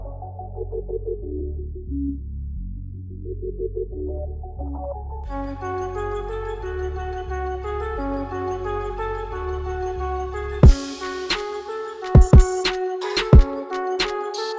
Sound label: Instrumental